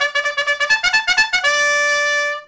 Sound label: CHARGE; Rabbit-Wars